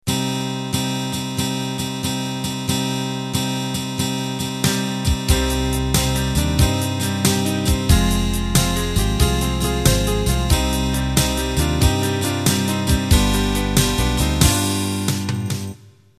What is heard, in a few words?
Unplugged Slow Rock Intro